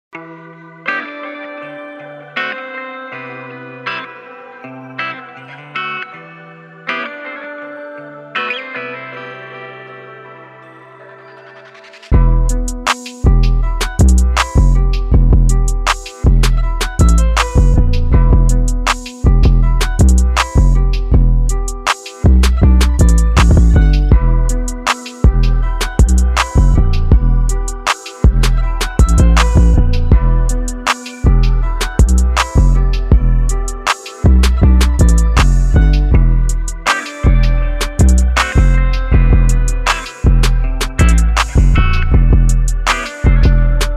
Instrumental

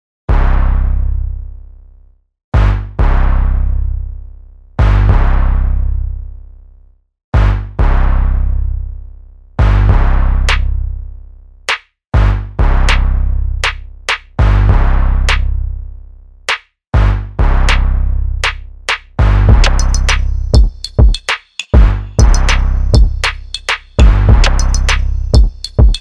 Instrumental

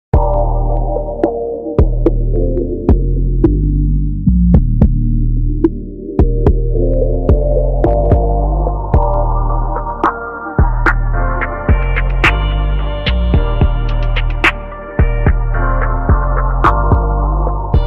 Instrumental

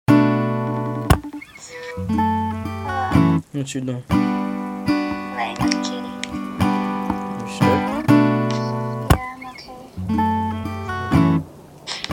Interlude Instrumental

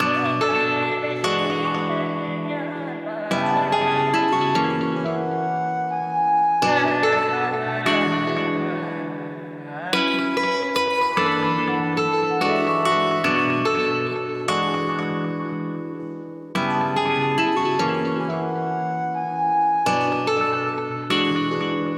B minor 145 BPM

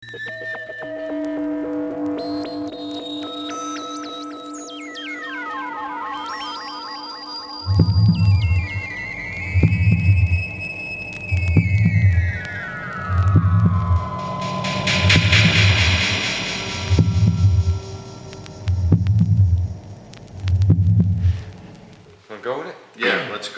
Instrumental

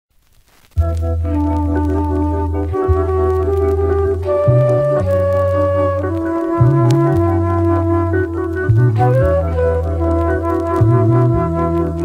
Instrumentals

